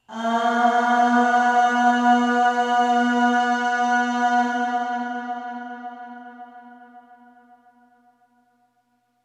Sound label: Aah Long B2 Long Reverb; Harmonic Building Blocks